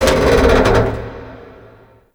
Metal Creak; Ambience; Warframe; TheNewWar; Zariman

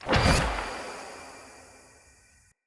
Shock Projectile Loop End

